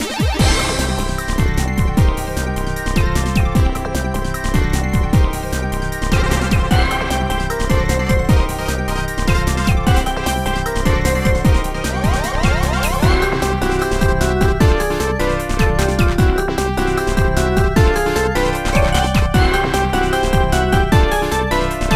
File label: "Hurry Up" theme